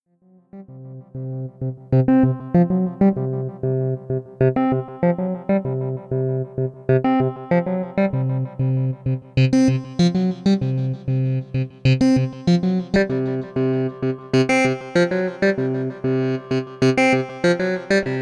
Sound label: SUPER6 Extra Waveforms